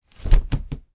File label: household umbrella open; Small Umbrella Opened; Umbrellas; Objects; McKinney Sound